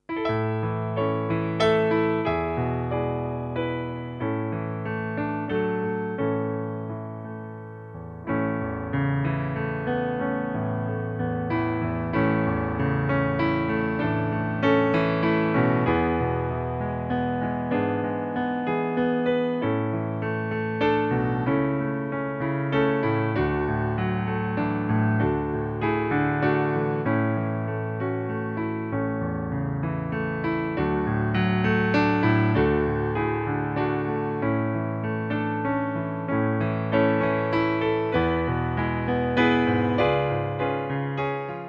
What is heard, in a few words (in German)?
Stille Nacht A-Dur 3 Strophen, pianoplayback; traditionell